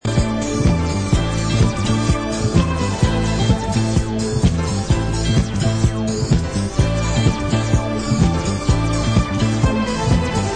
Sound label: Instrumental; Disco